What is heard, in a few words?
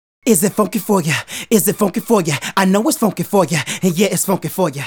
Male Solo, Hip-Hop and RnB Vocals